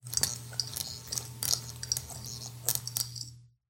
light flicker, ambient lights

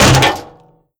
vent step, headcrab reviver, creatures

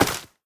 step, footsteps, player, mud

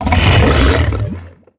idle in, barnacle; Half Life Alyx

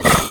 fast out, headcrab breath; reviver

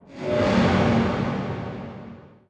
shake wet, strider, combat, damaged; creatures

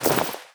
foley step

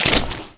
fs fm paint, footsteps; Portal 2

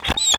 injured out, breath; reviver

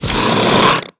attack growl, headcrab; creatures; vox

